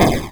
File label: hit